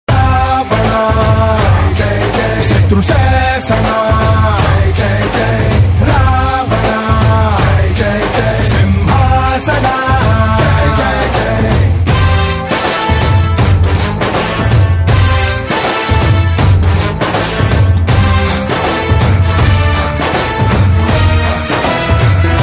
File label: Telugu Ringtone